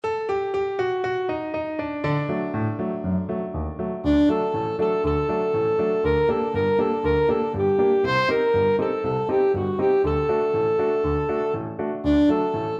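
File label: et dodim kala alto saxophone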